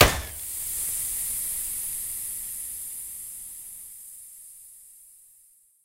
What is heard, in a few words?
tire pop; DanisRace